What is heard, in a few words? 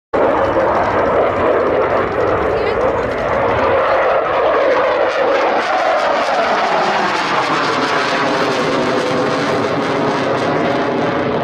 Rafale; جنگنده; رافال